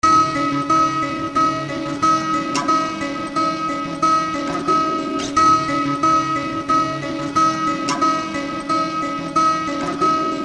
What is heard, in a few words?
fathom beat; weeklybeats-2016